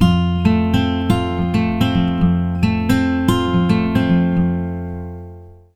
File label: 110 MAJ PICK F, Acoustic Guitar Loops; USB Soundscan vol.04 - Electric & Acoustic Guitar Loops; AKAI